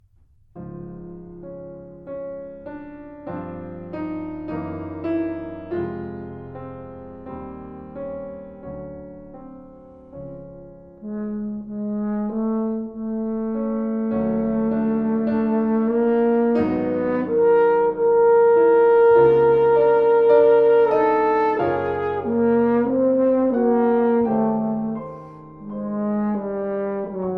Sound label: Suite For Horn And Piano, Epilogue; Alec Wilder